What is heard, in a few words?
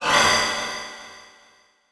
Transition Hit Impact Long; Production Element